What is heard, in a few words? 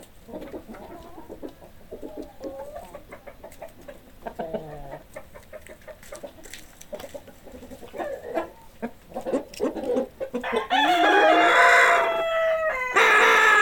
chickens